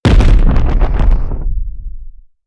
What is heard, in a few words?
e skill b snake 1 to 2 appear